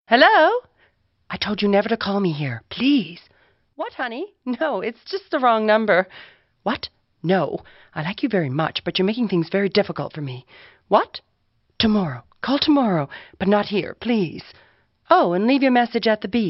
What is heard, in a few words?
Funny